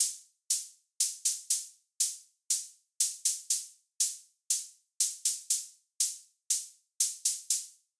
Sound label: brush hat 60 bpm